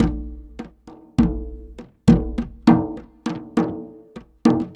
Java Ket Jak Harmo, Ethnic Percussion; Sonic Foundry (Sony Creative Software); Ambient Atmospheres & Rhythms

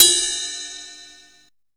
CYMBALS, Disco; Best Service ProSamples vol.48 - Disco Fever; AKAI

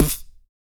VOX PRC, VOX KIT; ILIO; Vocal Planet VOL-3; Jazz & FX

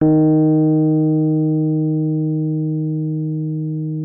D#3, RHODES; Session Instruments; Best Service ProSamples vol.42

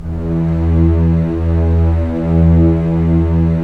STR, Roland LA Composer

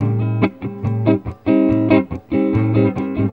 GUIT, Gipsy; Best Service; Ethnic Journey Sound Cube

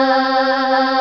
CHOR G2; S900

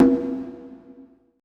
BONGO 1 L; BPC DRUMS

